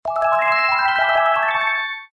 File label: wrong